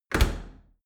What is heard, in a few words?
dry cleaner close; maschinen